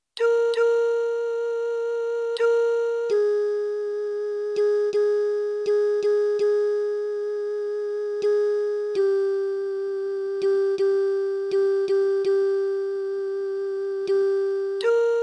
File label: soprano